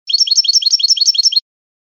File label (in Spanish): bibleos golpeados finos; campero